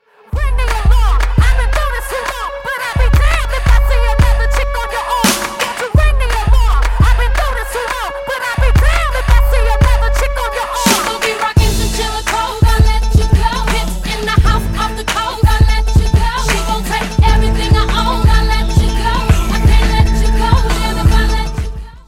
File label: Ring